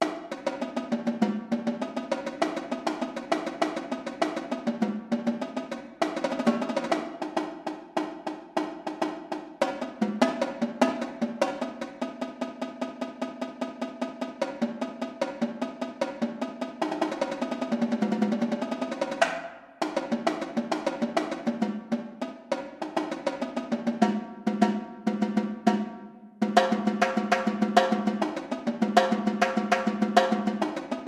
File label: tenor audition